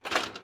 reel Bounce; grandspinn

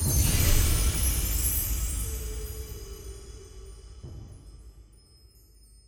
intro Free Spin Cong; wilderland

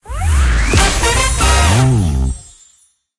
free spin outro; pork knox

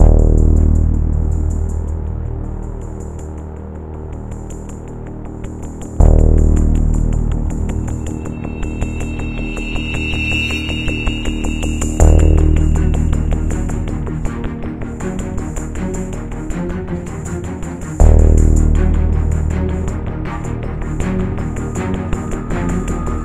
music slow; cashnoire

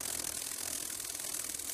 flying Beatle; hiddencoinsofegypt

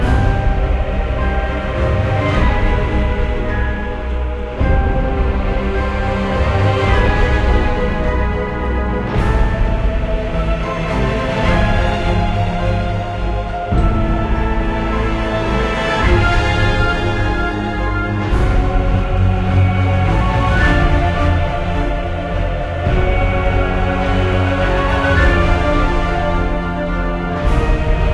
free Spin Music; goldengrimoire

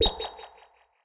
plus spin; pork knox

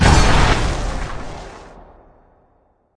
wild Symbol Hit; archangels

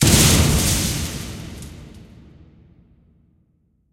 mega Win Text Change; lost relics

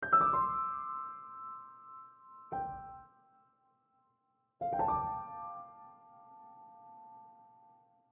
outro Free Spin Loop; narcos

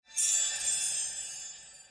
gem Fade; imperialriches

